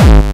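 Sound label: PULSE TRON, TECHNO TRANCE; Pro-Rec - Synthsonic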